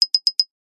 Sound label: cartoon knocking high pitched delicate glass